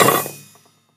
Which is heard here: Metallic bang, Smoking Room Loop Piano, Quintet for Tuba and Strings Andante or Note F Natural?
Metallic bang